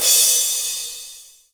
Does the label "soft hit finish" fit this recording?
Yes